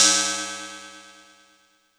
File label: Cymbal FX